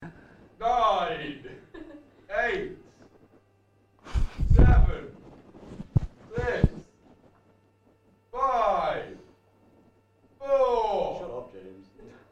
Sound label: Studio Recordings